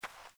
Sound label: Beaver Dig, Foley; Animals; Ambient